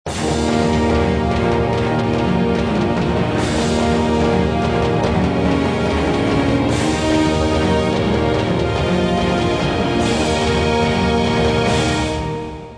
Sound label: Medieval Combat; Civilization Revolution